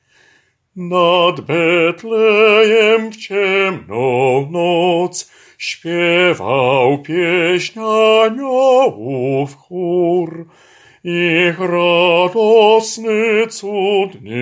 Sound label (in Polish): BAS; Nad Betlejem